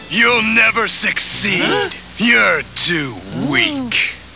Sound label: piccolo